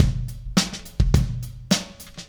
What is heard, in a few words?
DH Beat, Indie Rock Anthems; Sonic Foundry (Sony Creative Software)